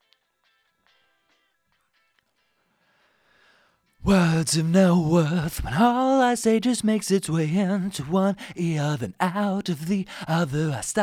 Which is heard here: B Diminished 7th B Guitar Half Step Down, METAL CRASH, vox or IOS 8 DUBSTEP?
vox